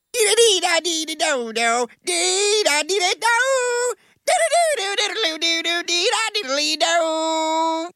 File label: do dee do